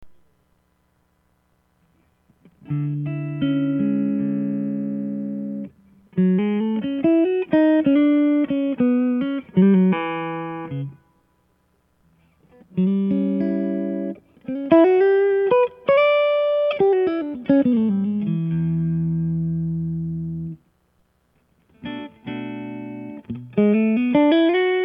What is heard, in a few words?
Clean, amp